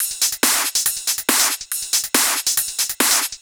Loop 140 BPM; vengeance essential club sounds-3

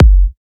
128BPM Kick; Vengeance Samples; Beatdancer Kit

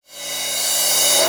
Cymbals Reverse; Vengeance Samples

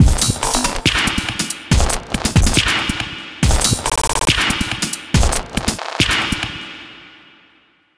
glitch loop, Dubstep; Dubstep Sample Pack

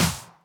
Clap; Vengeance Samples; VEC1 Claps

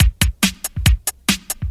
Breakbeat 140 BPM; Vengeance Samples; VEC3 Loops 140 BPM

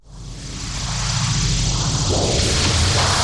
Sweeps Medium

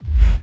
BD Gate FX, Bassdrums; Vengeance Samples

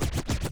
Cuts, Vinyl Record Samples

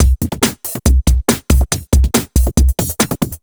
Breakbeat 140 BPM

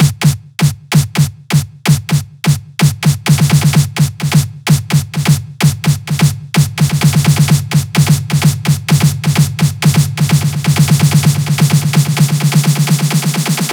128BPM Beatrocker Snare, House Electro Fills; Beatrocker 4; 8Bars; Vengeance Samples

